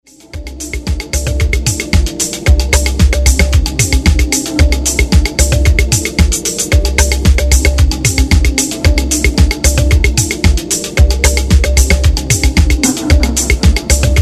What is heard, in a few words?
Marimba